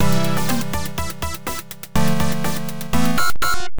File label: Failure!; Multi Game Scripter; PC-98